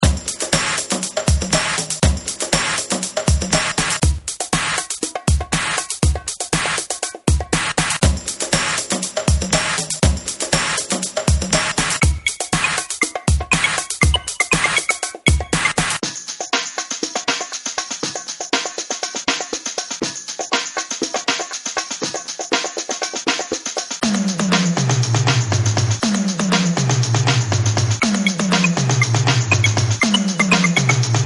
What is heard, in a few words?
drums only